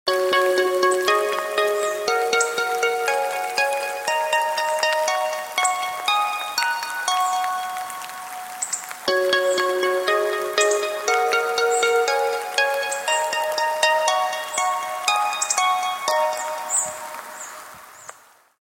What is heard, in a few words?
Mobile Ringtone